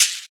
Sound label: sharp shaker sound sample F# key; percussion